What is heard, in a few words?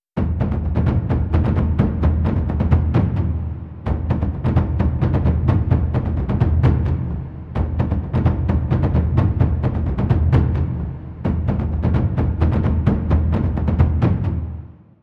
Loop Layer Taiko